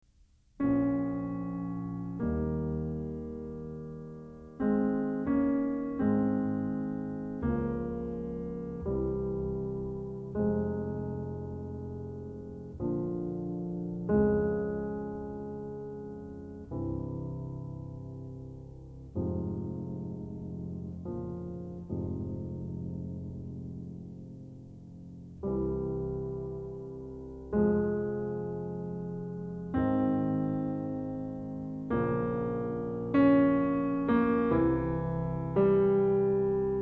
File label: Hymn; Fourth Way; Sacred Hymns